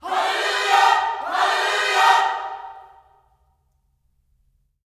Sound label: HALLELU, Gospel; Spectrasonics; Vocal Planet Vol.1 Gospel; HALLELUJAH